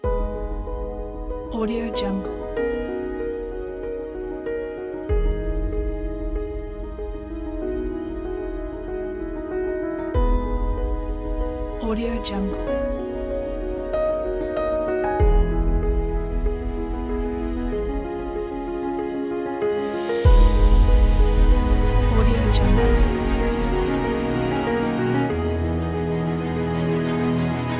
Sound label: emotional adventure